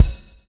swing

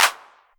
808 Clap; Drums

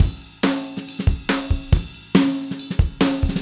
Acoustic Kit, Drums & Percussion

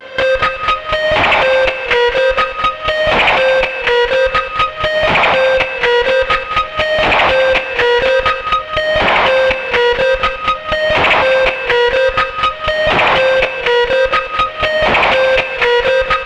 C4, Synth Line; 90 s Sample CDs; NiceBeats; SynthLine VOL-13

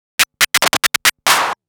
Scratch Fills 140BPM

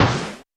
Roland U220 Electric Set, Drum Machines

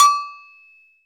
AGOGO; Club-50 - Foundations Roland; CowBel+Metl; Agogos

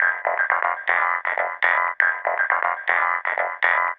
Techno Synth; Sonic Foundry (Sony Creative Software); Trance NRG VOL-2; Techno Synths

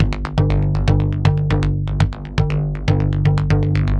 TALK, TALKDRUMSYNT, Yamaha VP-1; Music Store

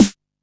Snare, Drum & Bass

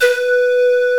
Siku F#3

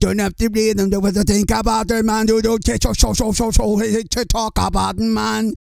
REGGAE, Vocal Planet World Voices

